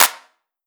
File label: Clap, 909